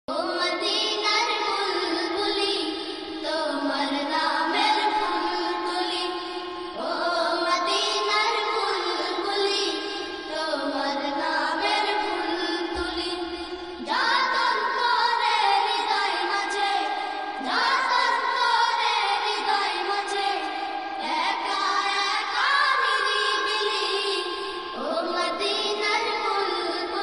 islamic ringtone gojol bangla ringtone; arabic

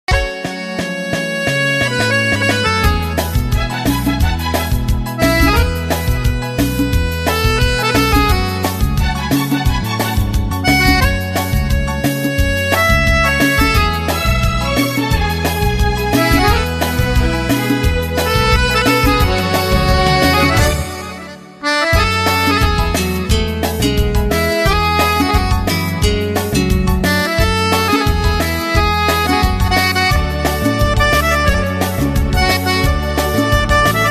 best hindi music ringtone

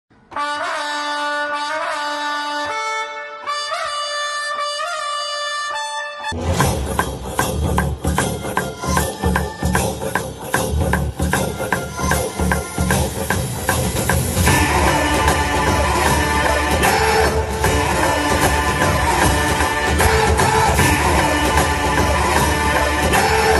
police station entry bgm ringtone; pushpa 2

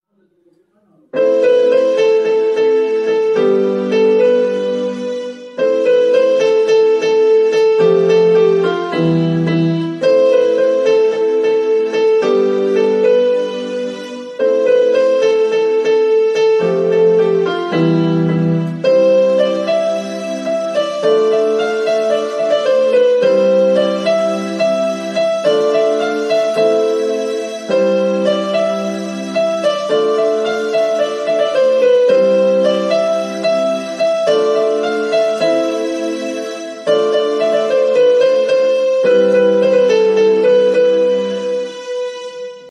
song background music bgm ringtone romantic; tiru movie

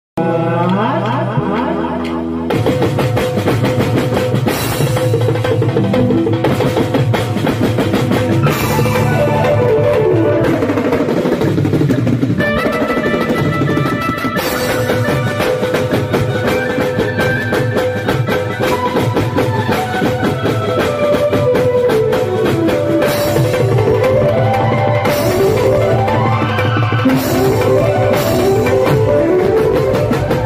Gujrati background music

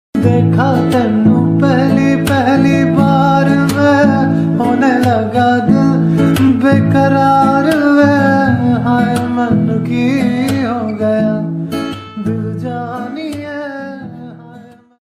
ringtone love ringtone hindi ringtone

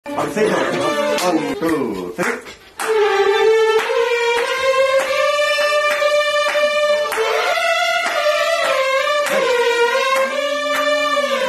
interval bgm; sitaramam; dulquer salmaan

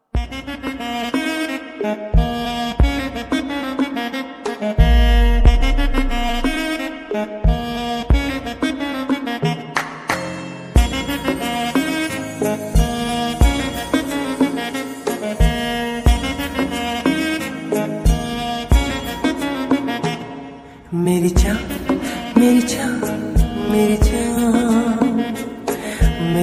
ringtone bgm

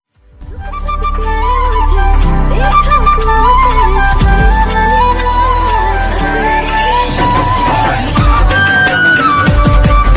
instrumental ringtone navaratri bgm ringtone

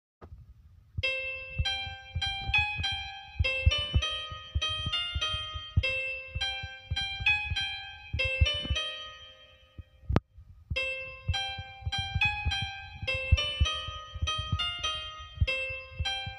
bgm piano

